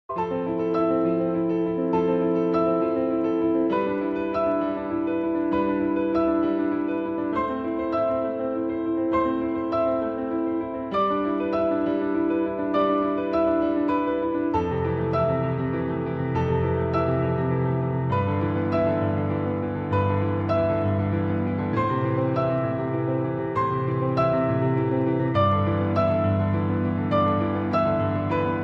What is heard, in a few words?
ringtone instrumental ringtone piano ringtone; interstellar